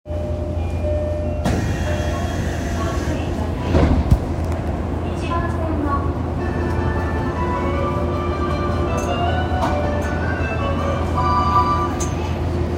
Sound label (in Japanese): 日比谷線築地南, train sounds